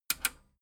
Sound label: metal button radio click disable fast